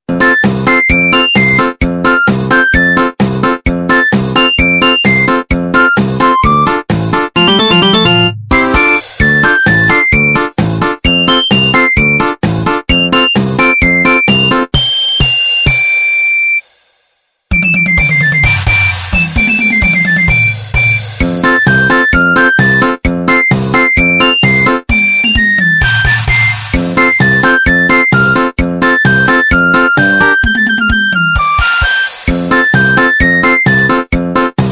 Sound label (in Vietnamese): Nhac chuong to cho nguoi gia